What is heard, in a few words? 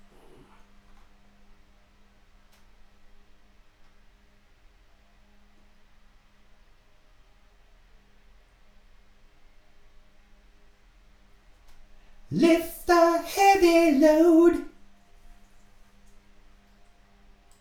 vocals